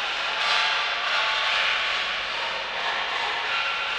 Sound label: Noise Loop, sparse soundscape